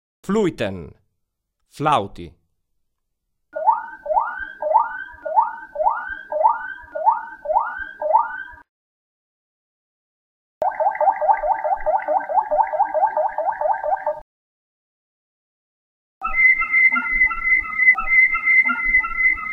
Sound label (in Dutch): Fluien, waterslager